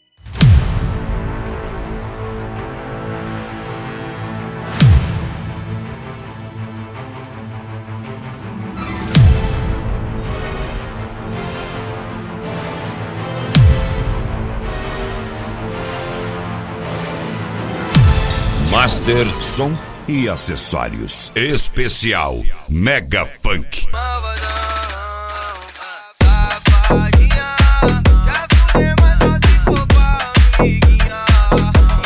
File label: mega funk; master som e acessorios